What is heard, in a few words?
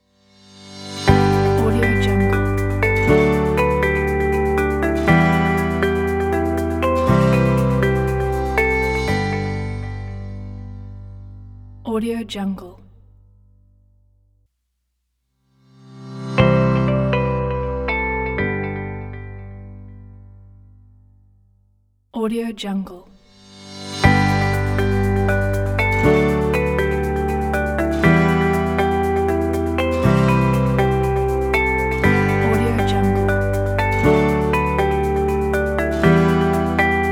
logo corporate commercial